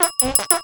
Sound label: clink fart short